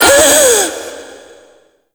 Minigame Ring Fail; Birth Minigame; Warframe; Jade Shadows

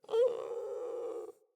Puppy Idle Coo; Kubrow

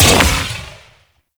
Explosive Impact; Weapons; Sapient Primary Explosive Impact F; Warframe

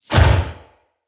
Ghost Flesh Impact; Weapon Impacts

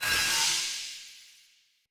Dagger Recall Button Press; Weapons; Player; Swords; Fey

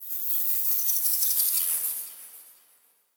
Sentient Lair Exterior Creature; Warframe; Ambience; Misc Creatures

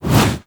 Generic Weapon Aim Transition In; Warframe; Archwing

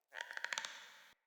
Cork Creak; Puzzles; Neathuns

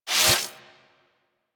Electricity Shock Burst Fire Stop; Oxium Osprey

